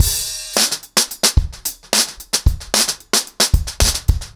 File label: 110BPM, acoustic drums, drumloops